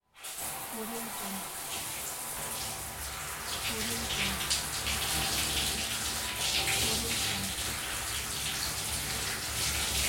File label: washing hair in bathroom; cinemandre